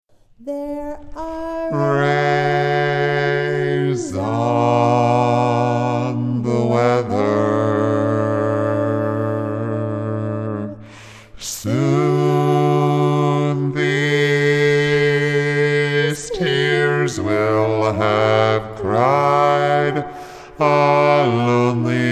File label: bass